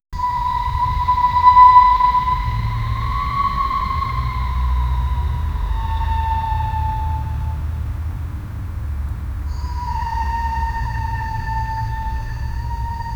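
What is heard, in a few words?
scary eerie outside abandoned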